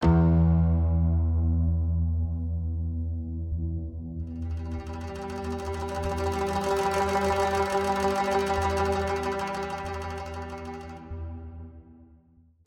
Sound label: title music